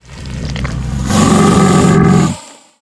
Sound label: worm in quick